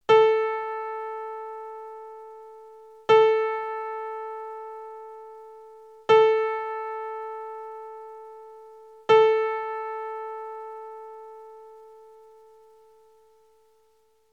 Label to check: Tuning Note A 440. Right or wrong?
Right